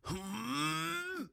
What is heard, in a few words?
Balloon pre burst; Hollow Knight; Zote